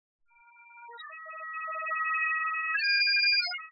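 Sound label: bagpipe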